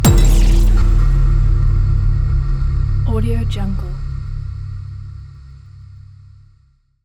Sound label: hybrid trailer cinematic tension hit